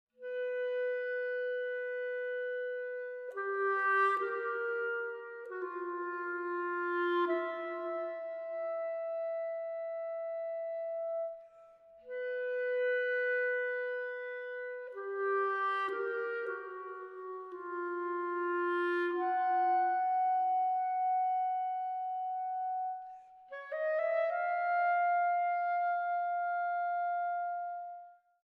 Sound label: solo clarinet